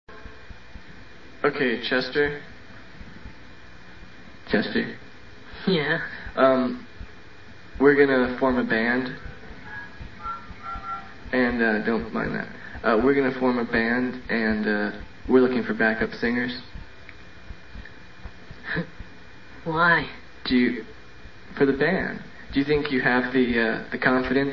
chester sing

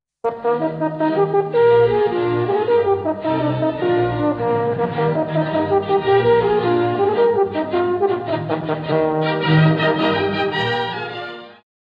horn

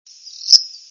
flycatcher derrrripp, flycatcher willow; birdsongs

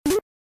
enemy throw

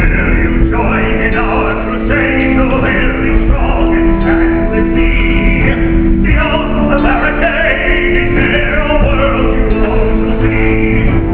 people sing

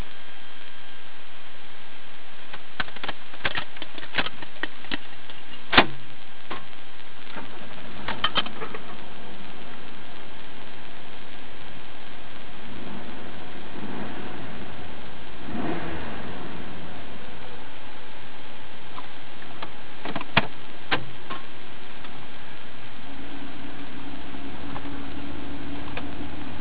Stock Idle

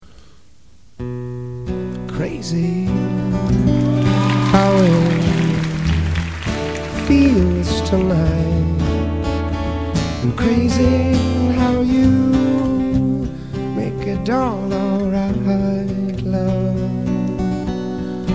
crush

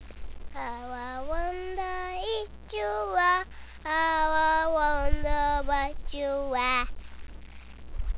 twinkle

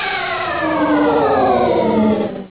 sound warp

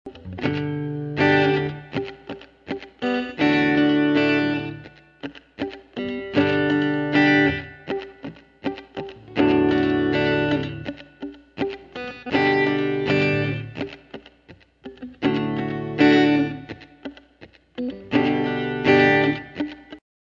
f muting

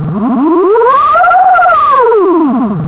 alien noise

